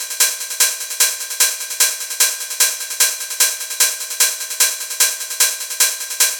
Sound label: Hard Techno Open Hi Hat Loop 150bpm; Ogkush Openhihat Loops 2